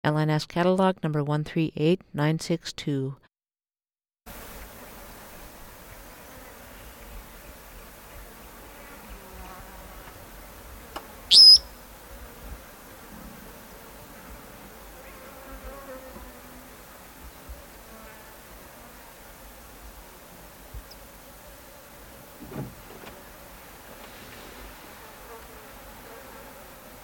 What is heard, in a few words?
red bellied parrot